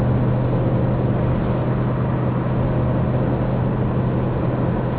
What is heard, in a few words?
70 mph cruise; Corsa; 65-70MPH Cruise